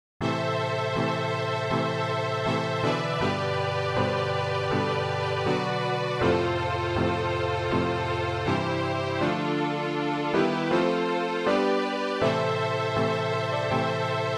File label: death theme